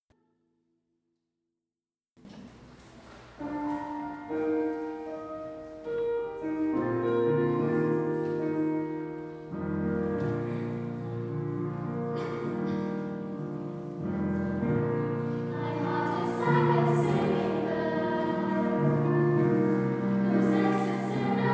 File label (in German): Like a singing bird Mittelstufenchor; Sommerkonzert 2019